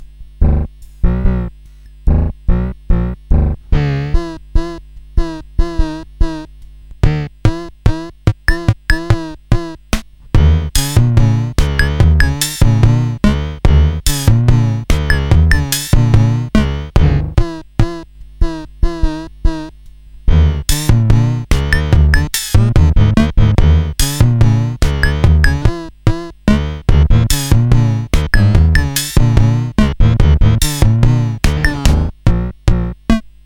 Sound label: casio sk1, circuitbending